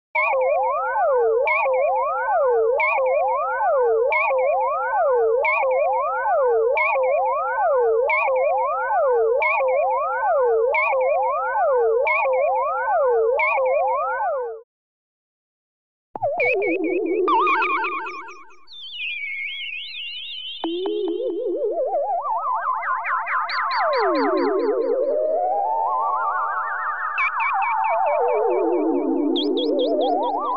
Sci Fi Weird Space Oscillations Sci Fi Space Oscillations; Underground Caves